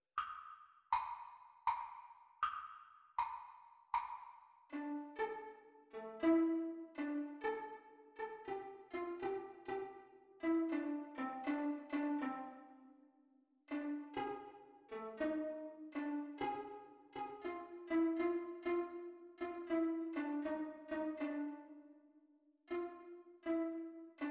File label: Viola Duet Playalong Practice Speed; Practise speed